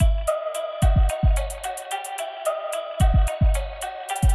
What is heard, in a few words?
Trap Loop